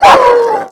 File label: animal dog pain; Call of Duty Modern Warfare